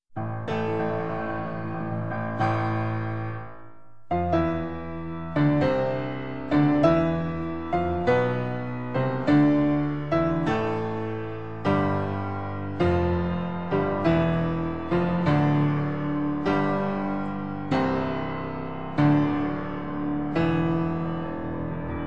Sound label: Concertino for 2 pianos; Shostakovich